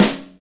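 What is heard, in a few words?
snare, Motown; Northstar; Drumscapes Roland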